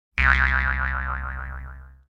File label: Cartoon Boing Jew's Harp; Household